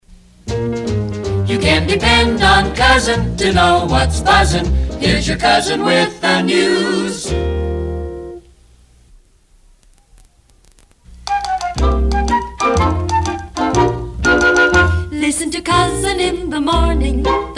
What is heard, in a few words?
Jingles; KZUN